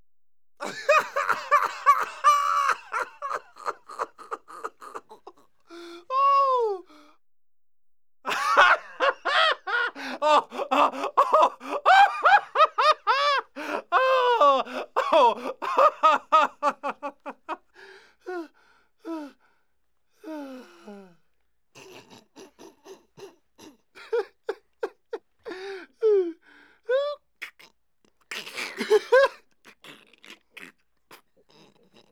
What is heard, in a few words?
Laughing Male; Vocals & Wallas